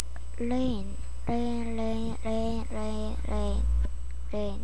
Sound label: rain